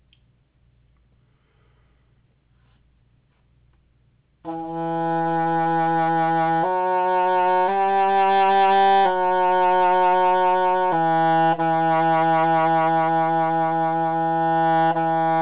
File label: new world last mvt, bass oboe